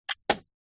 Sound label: human spit